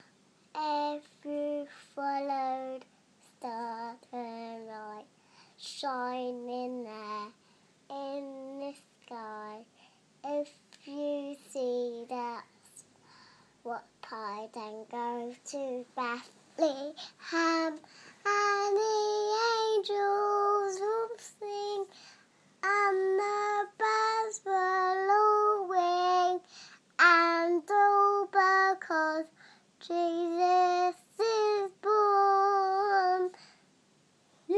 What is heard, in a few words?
singing